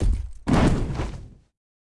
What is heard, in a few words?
Knocktaur Attack